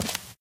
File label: white tile, footsteps